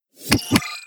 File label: Start Game Button